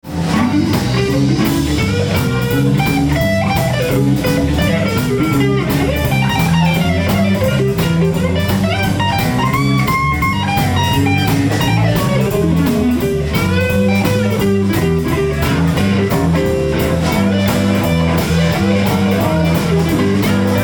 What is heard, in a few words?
swing